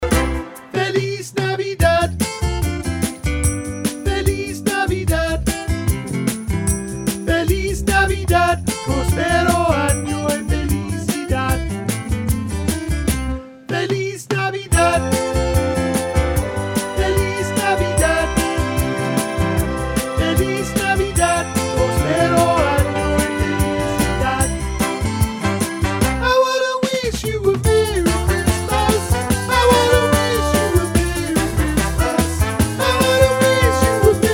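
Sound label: Soprano, rehearsal parts christmas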